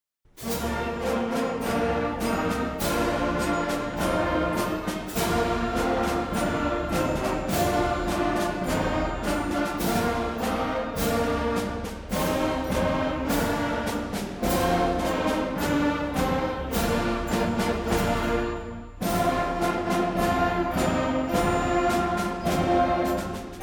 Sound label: ELEMENTARY BAND; CRIMSON HAWK ELEMENTARY BAND SUMMIT 2023